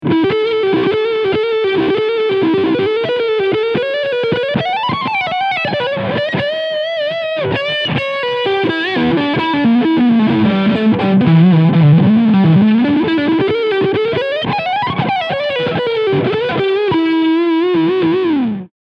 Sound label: Leadsound Neck EMG; Harley Benton Fusion III EMG HT Roasted SBK